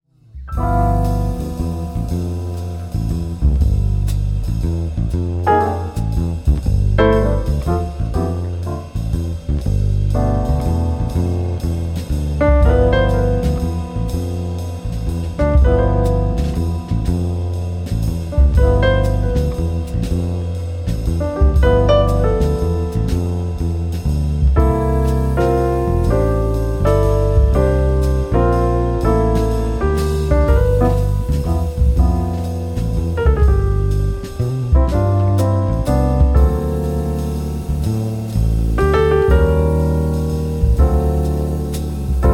trio

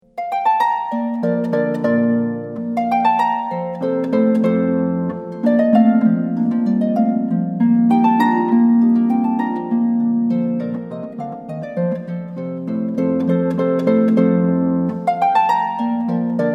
harp concerto; handel

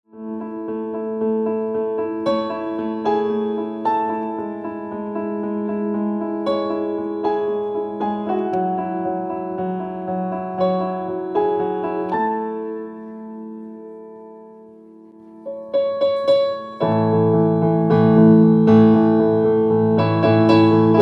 pianist; leicestershire

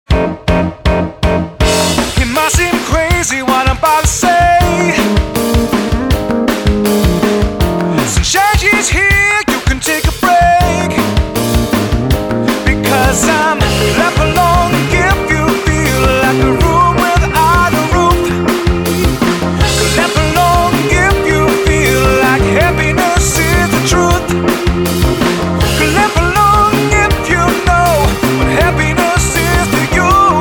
happy downbeat

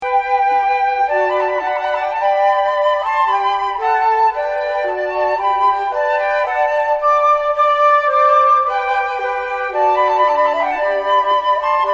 trumpet voluntary

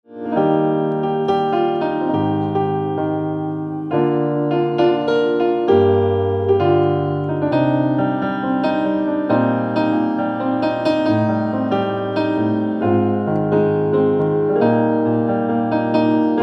pianist; leicestershire

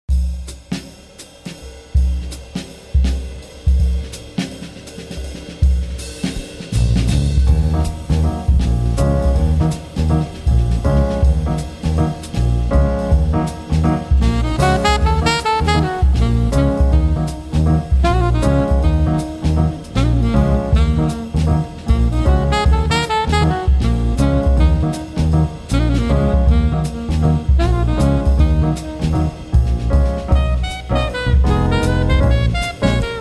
quartet

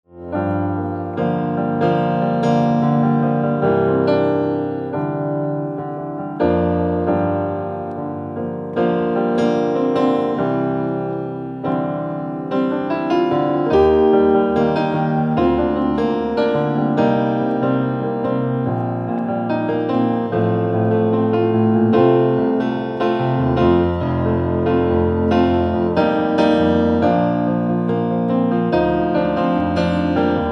pianist; leicestershire

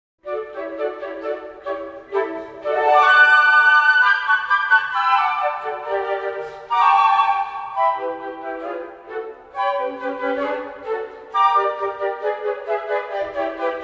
dance of the reed flutes

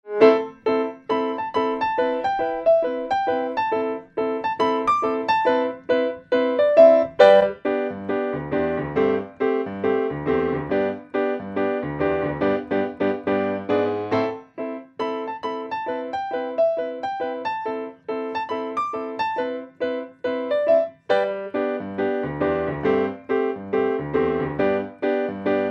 pianist; leicestershire

